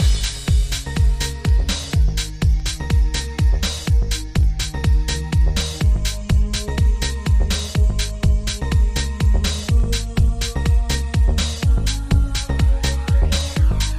DEEP CITY SOUL INSTRUMENTAL MIX MODERN SOUL

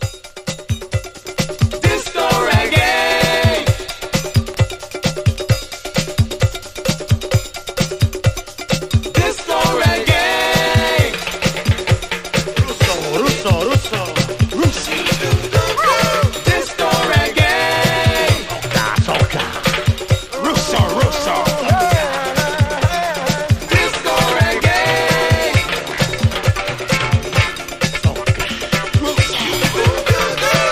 DISCO REGGAE GROOVE

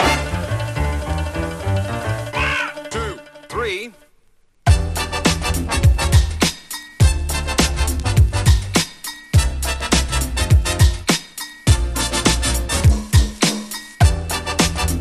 instrumental; hip hop rb; ISLAND; 4TH & BROADWAY

